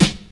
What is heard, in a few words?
old school hip hop snare b key; snare drum samples